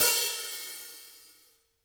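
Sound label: hi-hats, Interactive Drum Kits; Sonic Reality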